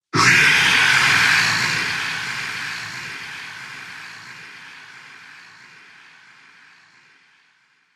Alien Dragon Cry; Alien Sound Effects

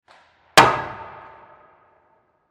Hockey Slap Shot, Hockey Slap Shot Puck; Hockey Slap Shot Puck Slam Into Glass Opposite Side Impact; Sports; Blastwave

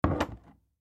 Aftershave Place In Bathroom Cupboard; Household; Primping; Human

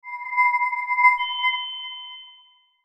Logo Bell Feedback; Sonic Logo Sound Effects; Production Element Imaging Element Accent Transition

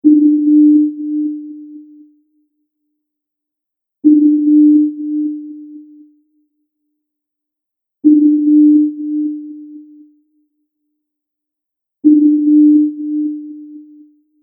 Sonar Submarine Ping Loop; Beep Sound Effects

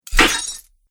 Bastard Sword Cuts Chainmail

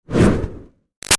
Fire Torch Whoosh Fast; Flames; Burning Tail; Objects; Big Room Sound

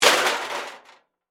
Impact, Crash, Metal, Object; Crash Sound Effects; Crashes (Sound Effects:Objects)

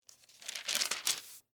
Bible Turn Page, Book; Foley; Book Sound Effects; Offices; Blast Wave

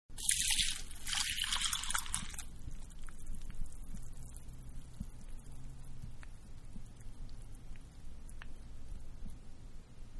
Cereal-Milk Pouring Onto; Pouring (Sound Effects:Objects); Manhattan Production Music

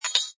Click Multiple Metallic Scrape Reverb; Scifi Production Elements, Set 2; Science Fiction Sound Effects; Lynne Music

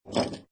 Pinball Sound Ball Roll And Passing Object; Video Games

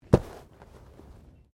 Body movement Fall Medium

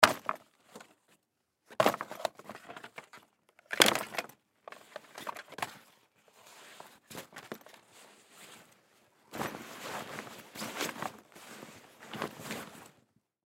Hockey Bag, Hockey Bag Equipment Put In Bag Long; SPORTS; Blastwave

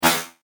Laser Plasma Swipe; science fiction sound effect; Laser Sound Sound Effects; star wars / trek style games; Andrew Fleming

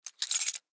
Key Ring Set Down; Set Downs; Foley; Sound Effects:Objects; SoundEffects-Blastwave

